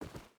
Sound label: footstep boots forest walk; Rust